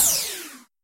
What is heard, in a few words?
ufo Shoot; AsteroidPatrol3D